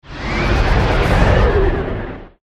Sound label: smoke